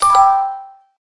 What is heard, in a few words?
basket; draw the path